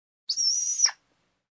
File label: Bird hooting